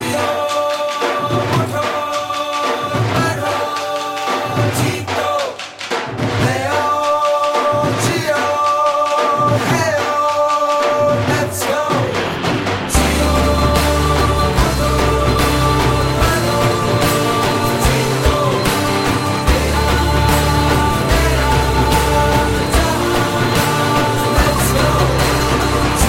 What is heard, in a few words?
Theme Ringtone